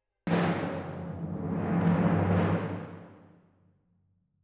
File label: Timp